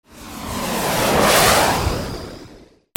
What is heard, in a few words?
flight